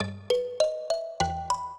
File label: minuet, mbira; Mozart